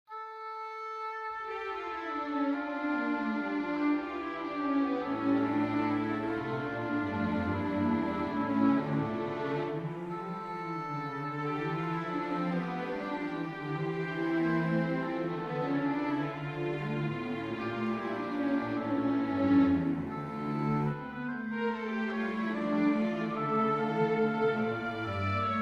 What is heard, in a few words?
prelude for oboe strings; bach johann sebastian vater unser himmelreich